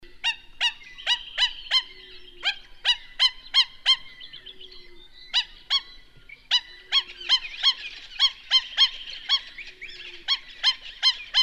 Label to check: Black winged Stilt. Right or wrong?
Right